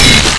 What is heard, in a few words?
melee axe, weapons